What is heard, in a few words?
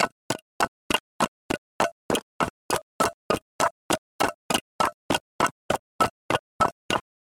dash geta